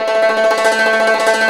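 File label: YANGCHIN tremolo; INIS - Opium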